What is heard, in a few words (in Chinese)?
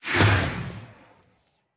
试炼刷怪笼生成声